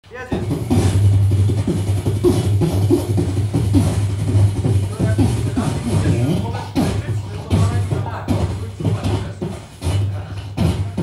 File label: beatbox; grzybsoniada'2011; Poland